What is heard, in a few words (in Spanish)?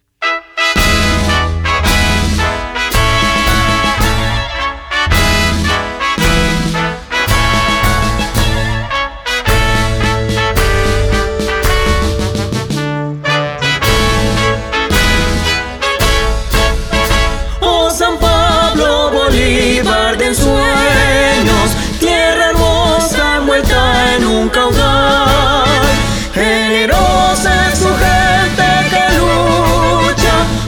HIMNO; HIMNO DE SAN PABLO; himnos de bolivar